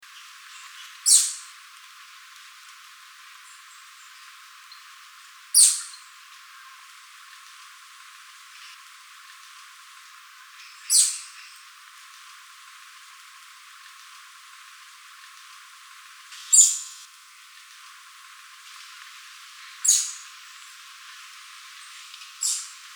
canto do chupa dente do nordeste

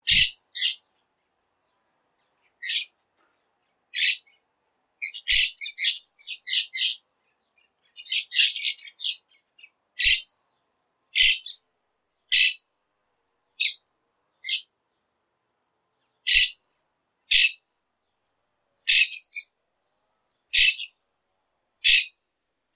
canto do perequito verde

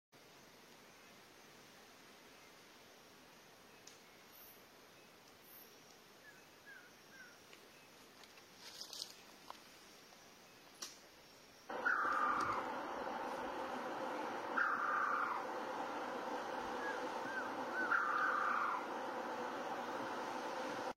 canto do surucua dourado

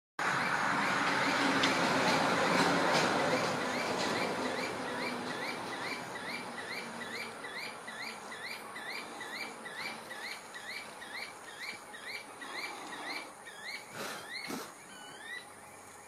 Canto do rapazinho carijo

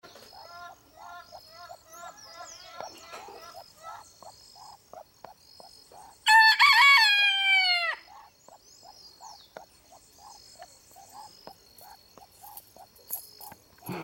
Canto do galo banquiva

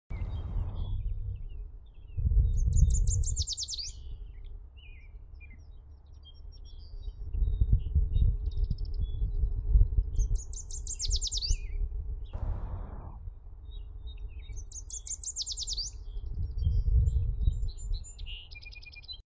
Canto do mariquita amarela

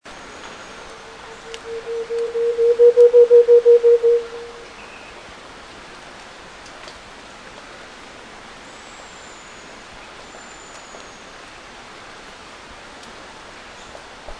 canto do tovacucu malhado

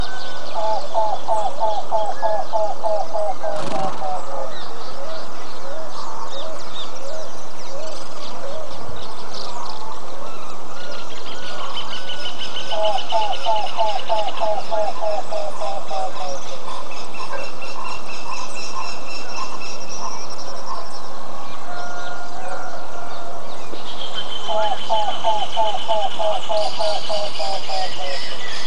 canto do papa lagarta acanelado

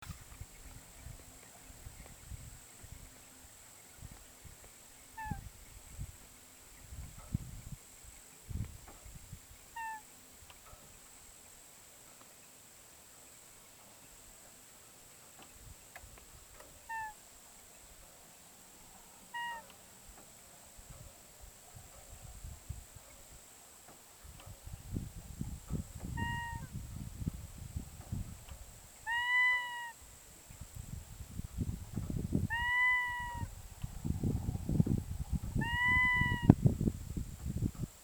Canto do mergulhao grande

